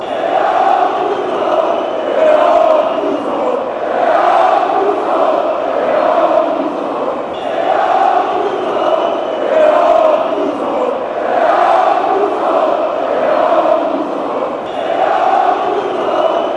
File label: you pig fanchants